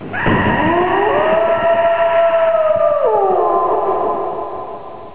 nemesis; cstrike

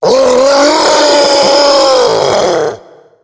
hulk yell; zombie plague

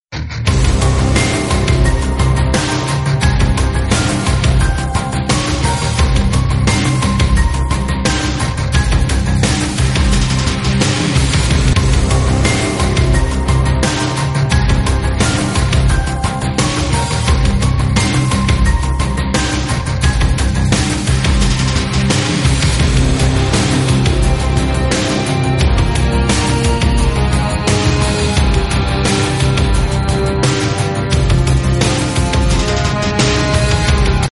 resident

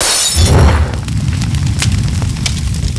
grenade explode; zombie plague

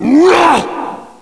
Attack, zombie; cstrike; Nemesis

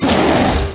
monsters pain; oblivion darkangels

